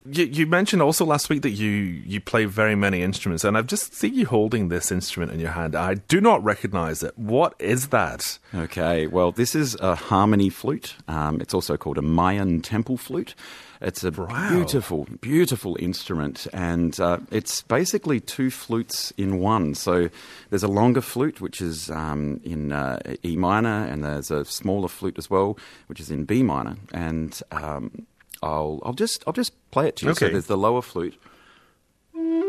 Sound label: harmony flute